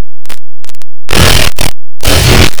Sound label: glitch thing